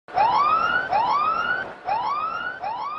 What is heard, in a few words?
no ball siren cricket